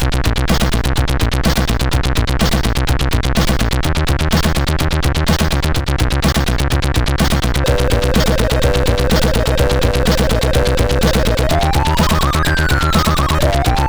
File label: Techno, sid CSG8580R5